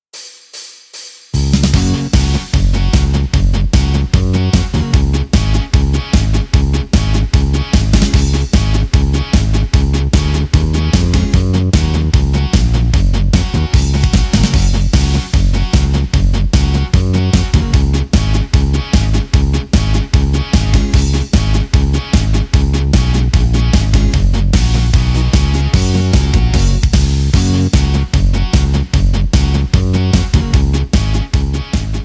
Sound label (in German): Polka Hörprobe GIS CIS FIS H